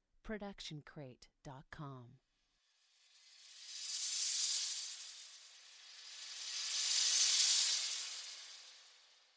studdered reverse cymbal answering echo; soundscrate

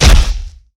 punch smacky; soundscrate

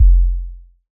electronic kick; SoundsCrate

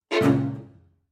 Violin Cello

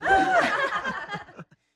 studio audience laugh; soundscrate

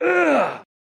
man injured grunt; soundscrate

